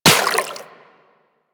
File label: Water Sprayer Beam Impact Flesh; Warframe